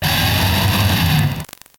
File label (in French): Cri